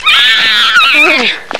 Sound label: Scream; Mickey